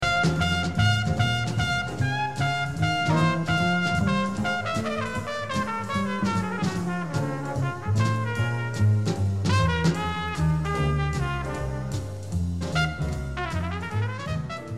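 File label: tpt solo, trumpet solo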